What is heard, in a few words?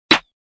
Snare; Khezie Hip Hop Kit